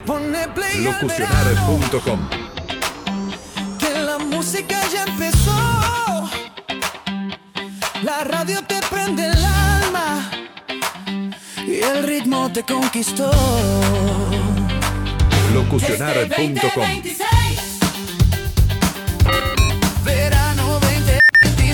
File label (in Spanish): Pack Jingles; verano 2026